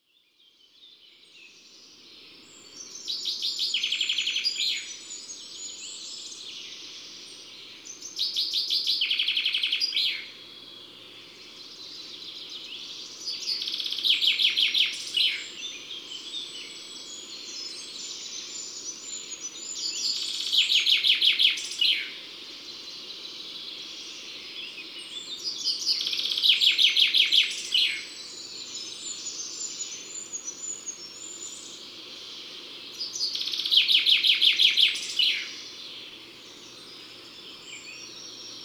Pinson des arbres; Drome; Concerts Naturels de la Drome; Studio les Trois Becs

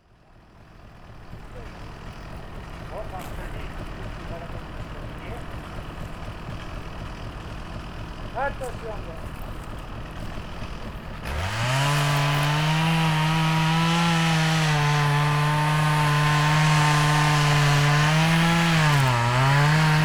Les bucherons; Saint Michel de Chabrillanoux; Ambiances Sonores d'Ardeche; Studio Les Trois Becs

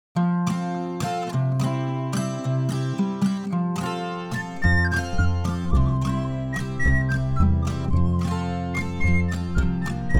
Le chat et loiseau INSTRU; cuicui et compagnie

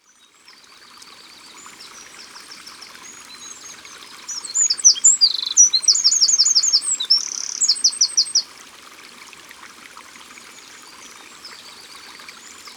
Troglodyte mignon, Au bord de l'eau

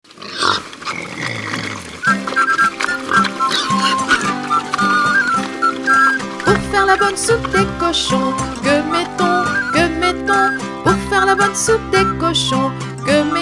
Chanson la bonne soupe des cochons

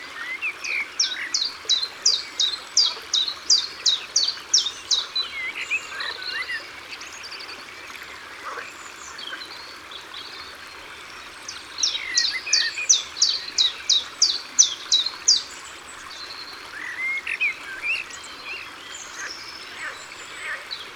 Pouillot veloce Au bord de l'eau

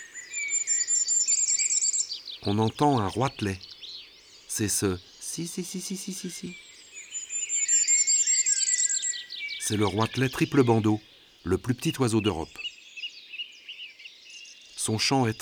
Roitelet a triple bandeau, ABC des chants d'oiseaux

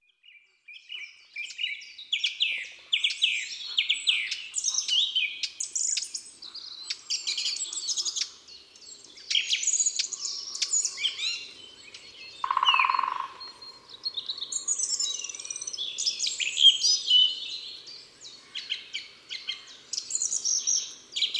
Grive Musicienne, Ardeche; Concerts Naturels De l'Ardeche

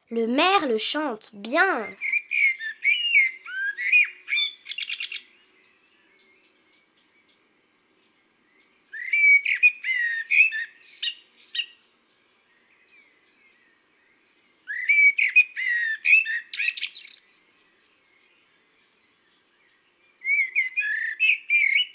Le merle; Mon imagier sonore de la nature

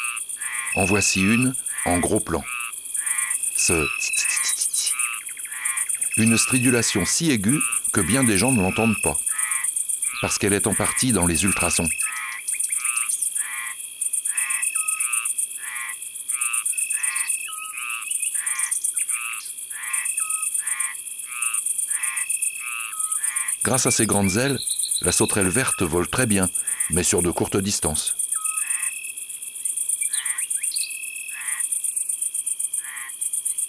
Grande sauterelle verte; ABC de la nature

